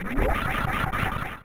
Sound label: Screw Attack; Metroid (USA)